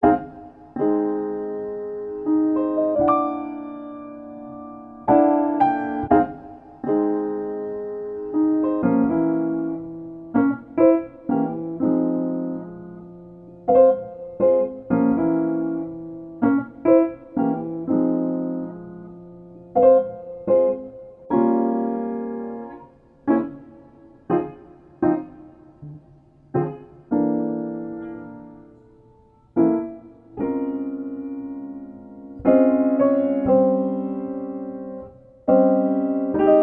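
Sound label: downtempo piano music, restaurant sounds; Fortepiannaya Muzyka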